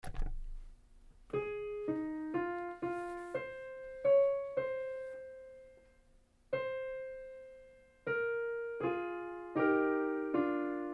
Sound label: Bass; Ave Maria, T. L. Victoria; Choral Resources; Bass practice